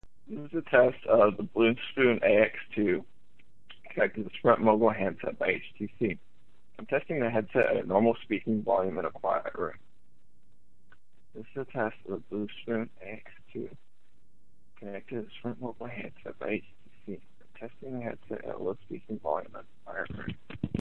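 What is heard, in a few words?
Quiet room, headset; BlueSpoonAX2; Mogul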